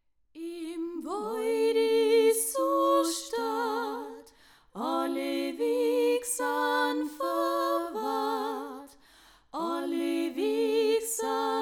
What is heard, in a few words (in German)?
Gesang